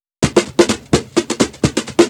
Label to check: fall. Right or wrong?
Wrong